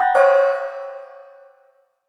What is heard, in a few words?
wrong; simon